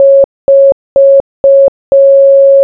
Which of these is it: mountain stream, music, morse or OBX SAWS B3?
morse